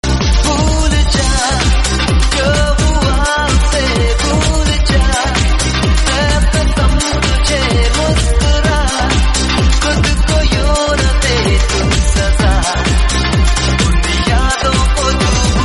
Hindi Hit Ringtone

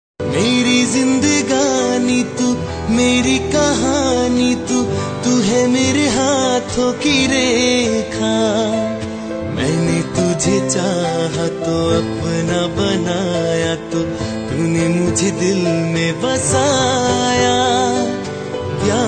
Hindi Album Ringtone

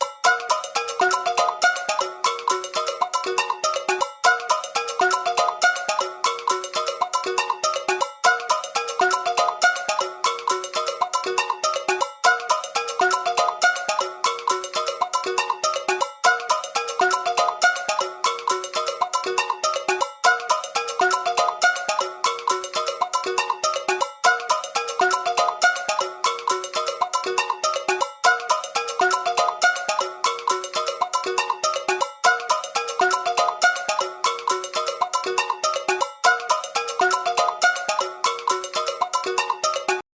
Samsung ringtone

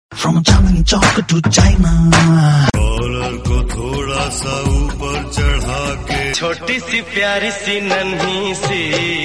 Hindi Remix

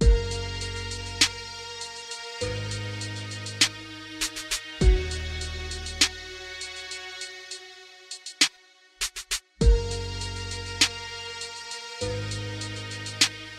English Instrumental Ringtone

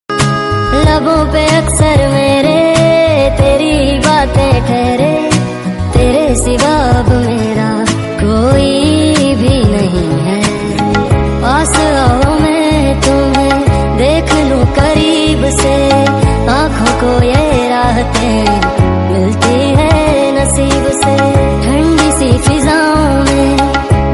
Hindi Sad

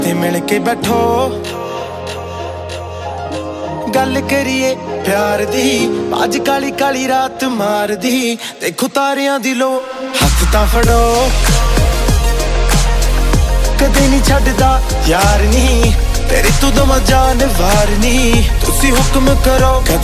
Punjabi Ringtone

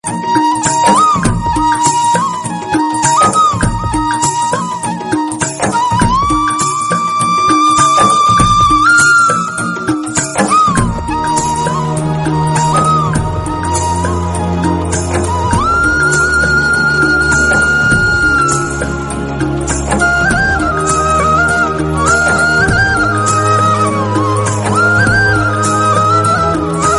Hindi Ringtone

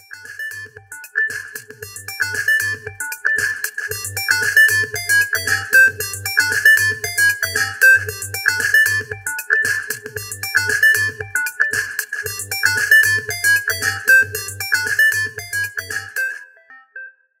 Ringtone; Vivo Y77e (t1)

